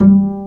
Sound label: STR Cb Pizzicato; Roland L-CD702; Cb Pizz 3